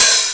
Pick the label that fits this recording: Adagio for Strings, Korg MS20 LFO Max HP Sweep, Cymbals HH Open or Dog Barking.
Cymbals HH Open